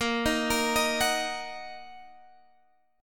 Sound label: A sharp Suspended 4th Sharp 5th, guitar chord